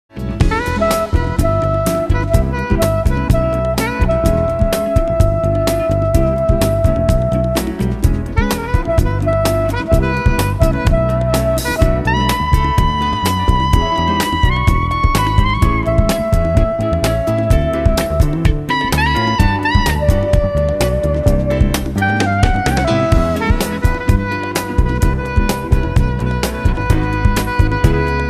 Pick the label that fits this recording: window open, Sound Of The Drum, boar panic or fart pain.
Sound Of The Drum